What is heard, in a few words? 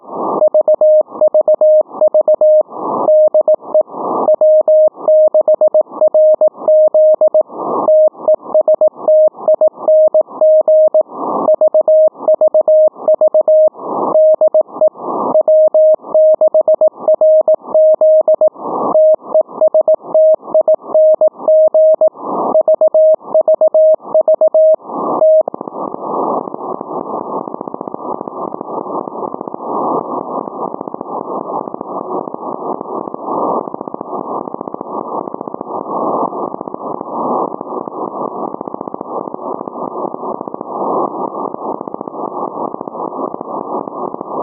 clicks